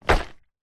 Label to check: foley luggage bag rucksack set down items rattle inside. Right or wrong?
Right